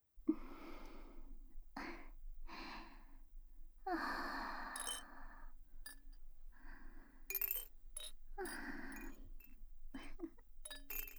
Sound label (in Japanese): ガラガラオナホコキループトラック, 効果音なし, ASMR; 【リアルエッチ感】北条櫻子はママになりたい～さくらママとのエッチな大人のバブ活保育園♪包容力MAX母性に甘やかされながら全肯定バブみ幸せ安眠あまトロえっち漬け～